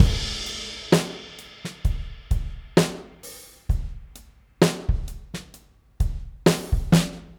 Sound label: 130 loop, kit; rigbyroad